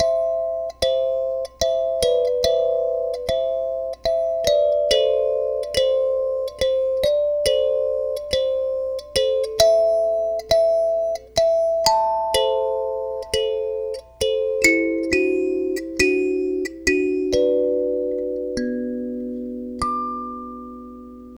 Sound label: kalimba